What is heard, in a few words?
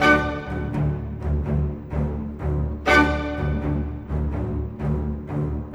Cinematic Orchestra; Sonic Foundry (Sony Creative Software); Orchestral Series VOL-3 - Cinematic